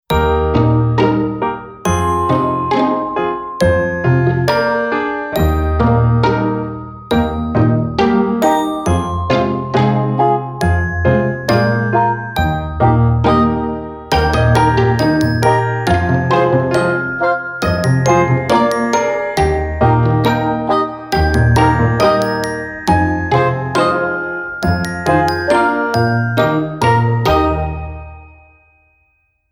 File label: Cheerful Commercial Bell